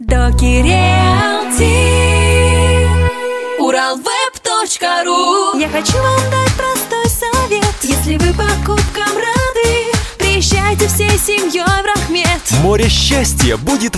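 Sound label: vocal Russian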